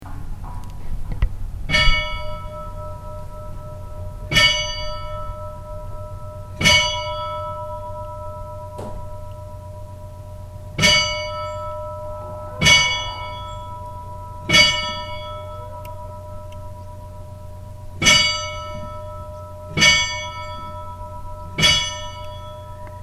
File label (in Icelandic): stadarbakkakirkja utfor upphaf, kirkjuklukkur; hunavatns-og-skagafjardarprofastsdaemi